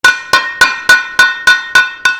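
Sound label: frying pan; フライパンを叩く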